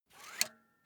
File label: air pump small out